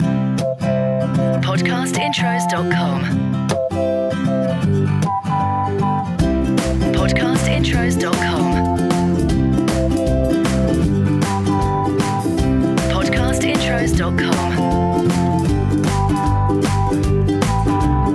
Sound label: CHILDREN Happy Go Lucky; Podcast Watermark